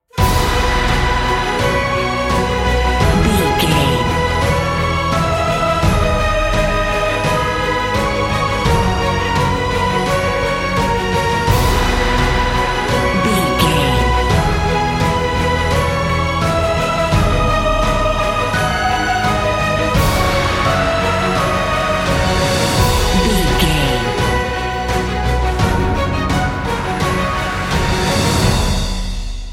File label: cinematic corporate